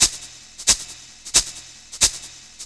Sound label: loop, engine; ships; Quantum Redshift; XBOX; zafir